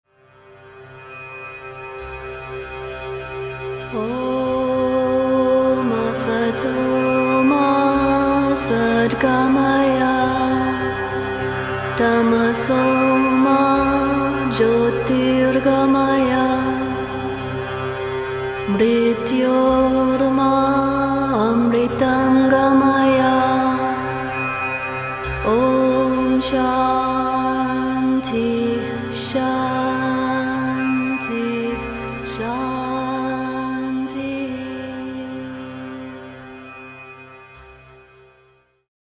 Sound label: Sanskrit Prayer